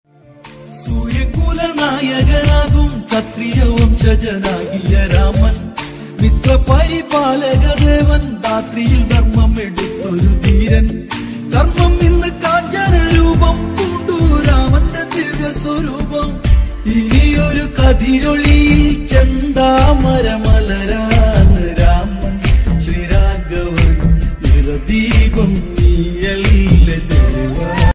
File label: devotional malayalam ringtone